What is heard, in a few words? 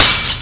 GLASS